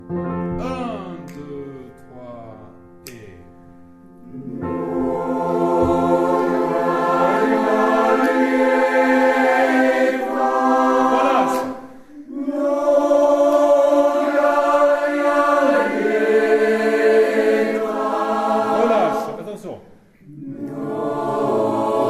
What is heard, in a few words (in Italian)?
Tutti; MNOGAJA LJETA